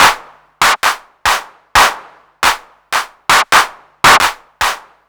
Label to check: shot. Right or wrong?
Wrong